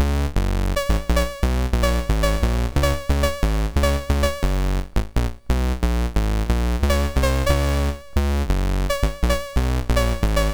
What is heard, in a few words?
X-Mas, MOS6581R4; Bain David